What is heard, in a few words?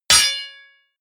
block metal large